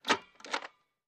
Paper Hole Punch Punc, Paper Hole Punch Punching Holes In Paper.